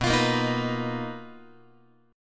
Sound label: B Major 7th Flat 5th B down Guitar; strummed